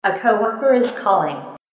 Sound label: Melody, RingTune